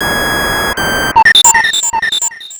magnet; mother2

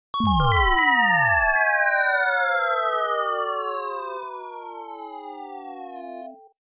Falling into underworld; mother2

